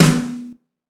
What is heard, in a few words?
boom snare drum E key, steel snare drum sound tuned to the E note